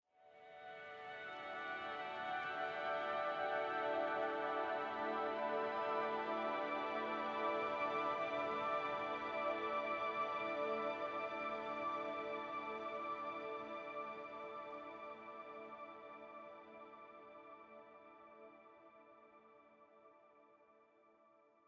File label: swamp exit pad; lvl ending